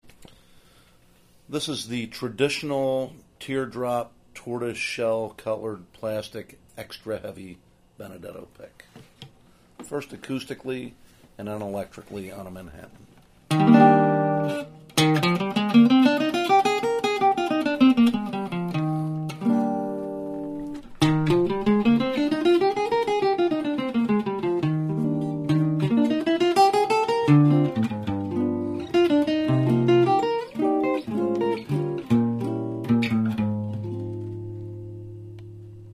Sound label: Pick Traditional, guitars